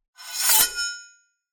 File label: Sword Being Drawn